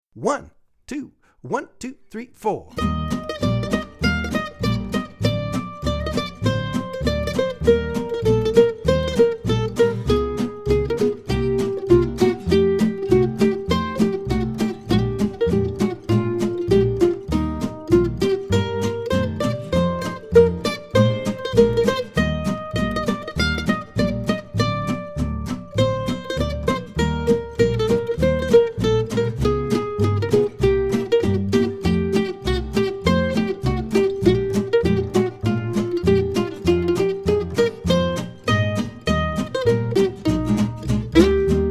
MANDO

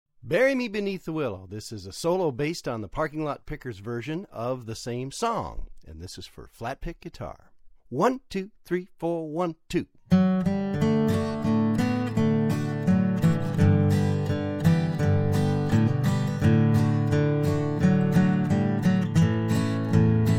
Gtr

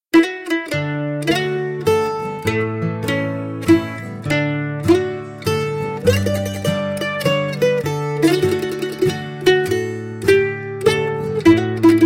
Wedding Waltz

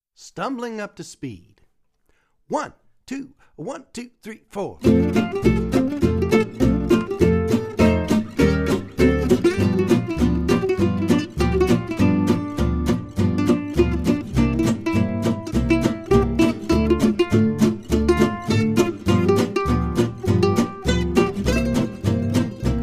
mando fast

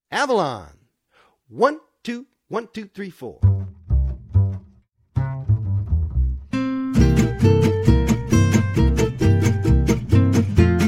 gtr sample

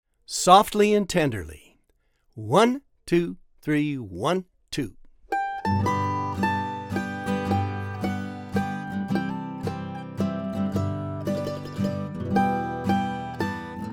Softly and Tenderly Lyrical Gospel Tunes Mando

